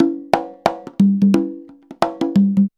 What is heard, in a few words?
CONGA 089, Percussion Loops; USB Soundscan vol.56 - Modern Percussion Loops; AKAI